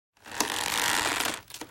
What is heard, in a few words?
rope stretch; cstrike